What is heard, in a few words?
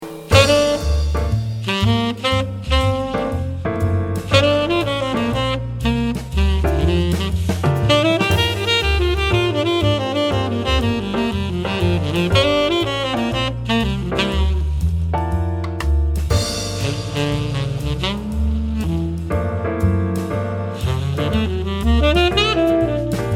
Tenor Jazz